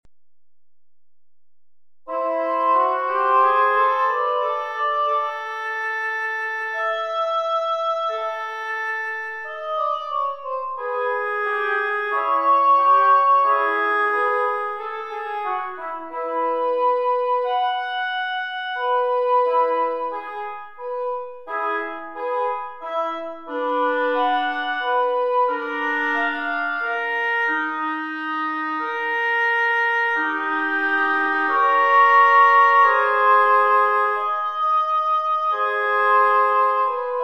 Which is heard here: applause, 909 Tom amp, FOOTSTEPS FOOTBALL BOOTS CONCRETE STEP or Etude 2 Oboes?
Etude 2 Oboes